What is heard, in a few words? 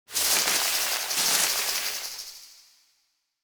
Field Mat Tap; Yu-Gi-Oh! Master Duel